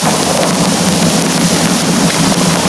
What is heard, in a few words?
magnify; gorge